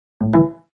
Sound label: connected